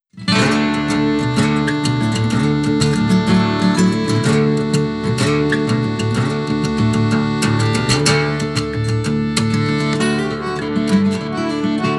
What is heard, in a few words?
Jig; throughthewood; Jaquies